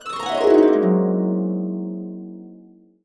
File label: AB, Harps; USB Soundscan vol.62; AKAI